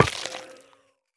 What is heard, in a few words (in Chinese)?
幽匿催发体破坏声